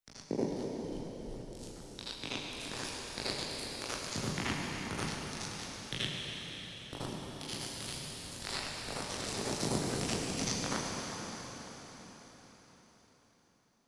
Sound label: Hall Big Bright; tcmegaverb; reverbs